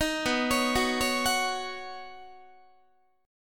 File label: B Major Add 9th B Guitar; Badd9; arpeggiated; JGuitar